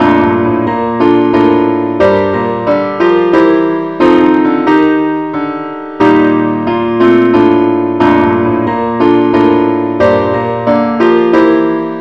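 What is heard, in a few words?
keyboard only key B flat; Amazing Grace; John Newton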